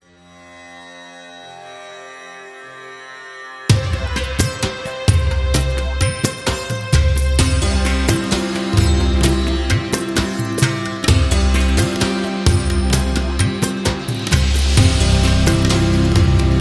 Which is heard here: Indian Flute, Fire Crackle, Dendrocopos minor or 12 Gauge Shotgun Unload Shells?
Indian Flute